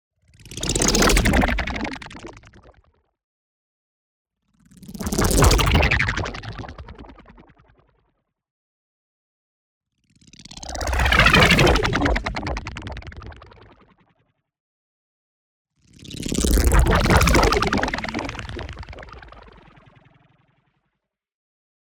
NEON WHOOSH LARGE SLOW Mass Absorber; boom library

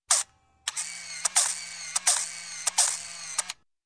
Camera 35mm Automatic Advancing To First Frame; Sound Ideas 2000 Series

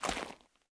zombie footstep mud; DeadFright

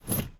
driver foley wheel turn; Blind Drive

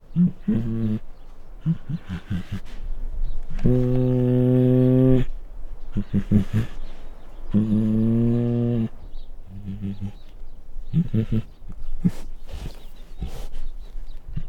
Female Bengal Tiger Growls and Snarls; Sound Ideas; Tigers Sound Effects

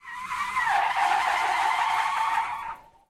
skid asphalt; Blind Drive

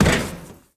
Bodyfall Hollow Metal; SFX Kit; Bodyfalls

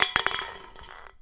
Hit Soda Can Drop; SFX Kit; Foley - Misc

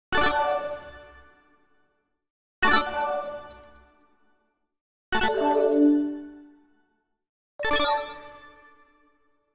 Arcade Click Positive

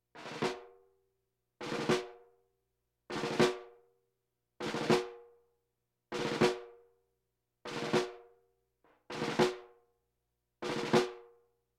Percussion Bongos Tympani rolls Gongs Drums

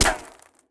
gun miss; Blind Drive

